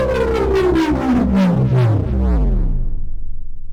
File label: powerdown; baseq2